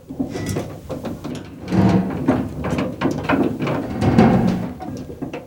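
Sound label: rocketship metal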